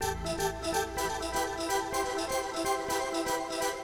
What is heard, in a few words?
SYNTHPAD HOUSE 125 A; eJay; Sphere